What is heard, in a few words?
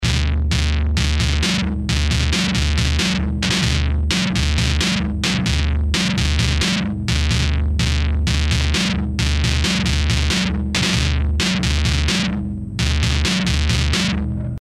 cajon